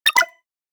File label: Futuristic error